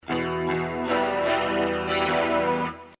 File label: Super O zombi dist